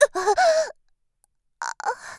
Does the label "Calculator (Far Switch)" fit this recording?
No